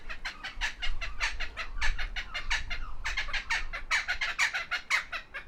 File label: Dacelo leachii; Praga